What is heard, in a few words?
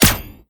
sfx kill